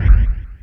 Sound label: space kik; MAGIX